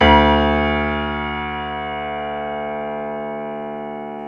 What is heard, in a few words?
PNO Grand Pno; Club-50 - Foundations Roland